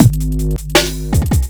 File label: Speed Loop, drums beats